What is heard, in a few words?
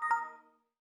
Windows Error; Glass